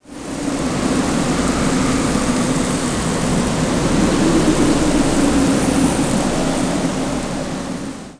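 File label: wind hill, ambient savanna; World of Tanks Blitz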